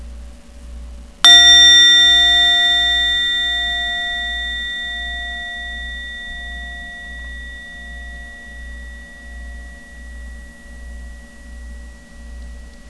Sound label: japanese rin med, medium rin gong